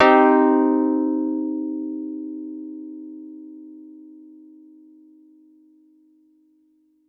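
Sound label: Piano Wurl minor hard; TrashLibrary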